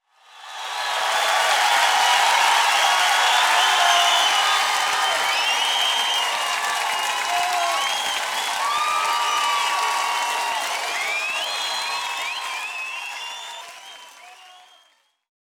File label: Crowd cheer medium; people; applause